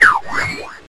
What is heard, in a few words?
Circle Throw